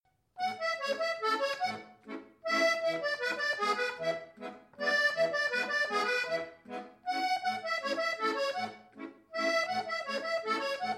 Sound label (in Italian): Valzer Aviglianese